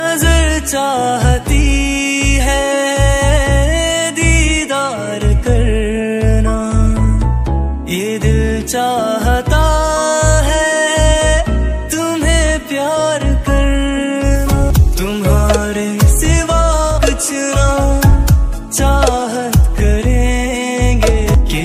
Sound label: Love Song Ringtone